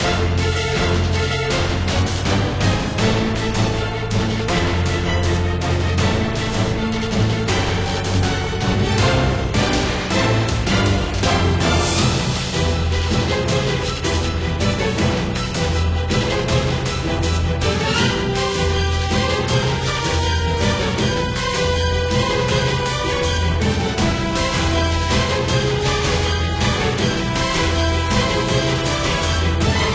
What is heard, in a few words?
Sound Boss; Stella Chronicle; android